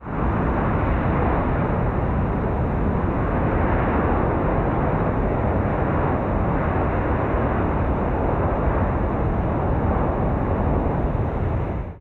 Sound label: ambient battle; World Of Warships